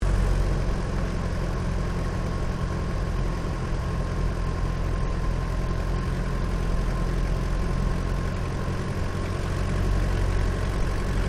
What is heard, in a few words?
AIRCRAFT DH82A DEHAVILAND TIGERMOTH TOUCH AND GO INTERIOR LOOP; RAAF Tigermoth; Airplanes; Vehicles